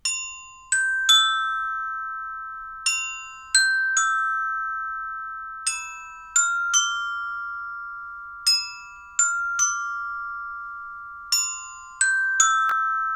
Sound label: glockenspiel